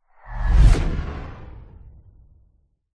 sound design cinematic whoosh short futuristic synth into impact